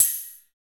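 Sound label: HH, hi-hat; Sound & Vision - Gigapack I; DANCE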